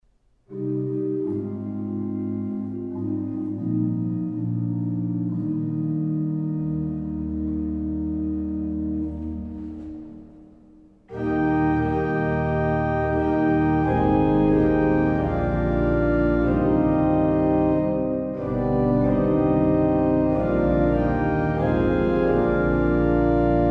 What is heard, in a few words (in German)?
Orgellieder; EG 181.6 Laudate omnes gentes